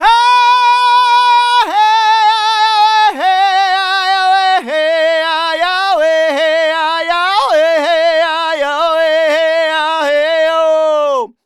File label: Native Vocals, Native American; Continental Drift Aftershocks